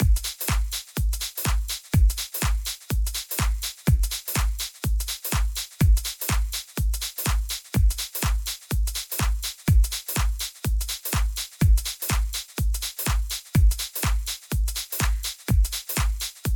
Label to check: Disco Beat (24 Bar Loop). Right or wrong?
Right